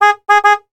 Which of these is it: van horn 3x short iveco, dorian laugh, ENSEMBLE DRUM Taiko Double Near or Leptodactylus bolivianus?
van horn 3x short iveco